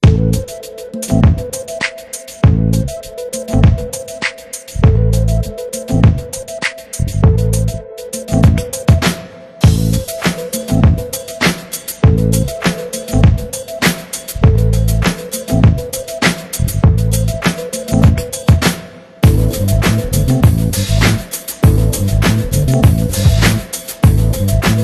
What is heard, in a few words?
LET THE DRUMS SPEAK